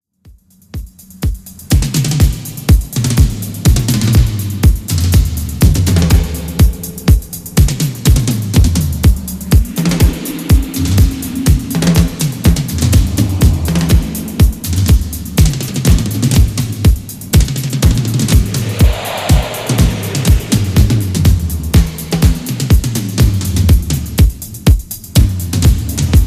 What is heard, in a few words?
Sweet Drums